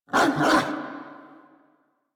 dig bark, Kubrow; Enemies; Warframe; Tame

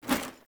Foley Action Armour Melee Swipe; Characters; Player; Deora Armour

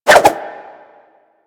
Dagger Spin Whoosh; Warframe; Weapons; Dual Daggers

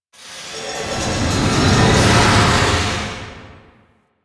Town Large Moving Object; Ambience; Duviri; Warframe

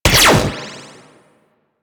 Spectra Fire 2D, weapons

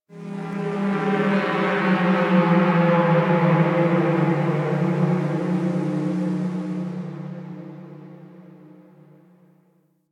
Texture Medium, Ambience; Sentient Lair; Warframe

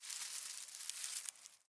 Forest Open Glade Grass Move Close, Day; Ambience

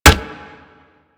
Staff Ground Plant, Weapons; Warframe

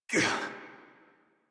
Pain Adult; Warframe; New War; Adult Operator

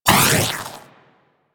Armillary Sash Impact; Warframe; Warframes; Nezha

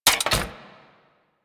Spear Throw Mech; Warframe; Weapons; Corpus; Spear Gun

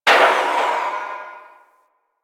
Landscape Construction Site One Off, Ambience

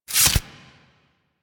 First Stab, Forest Night; Warframe; Sacrifice; Ballas

